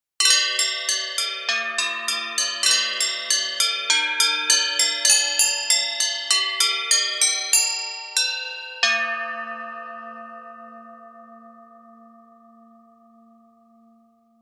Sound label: Bells; cinque WAVs con diversi colpi del batacchio, tutti registrati con il naturale decadimento